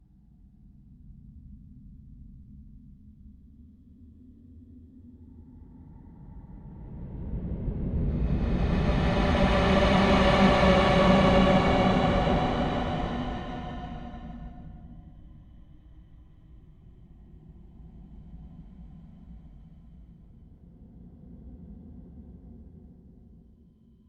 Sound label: Horror Whoosh